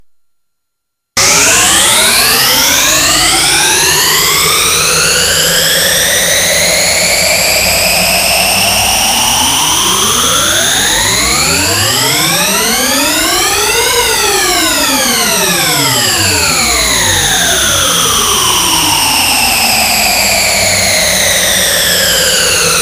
noise tri offset, multiphase; Scott Stites

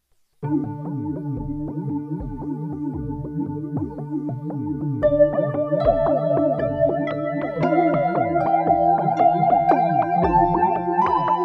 tri pulse, multiphase; Scott Stites

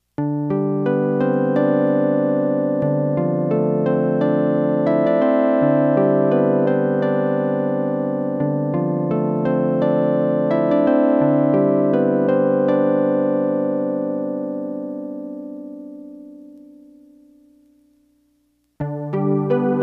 delay compare; Scott Stites

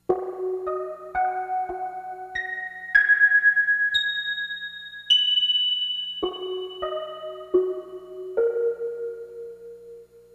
dry dimc reverb; Scott Stites

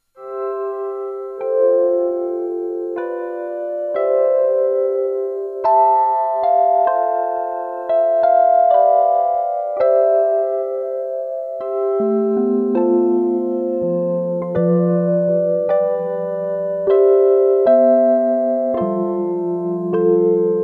xfade, multiphase; Scott Stites

